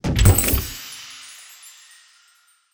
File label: Chest Open; portal quest; android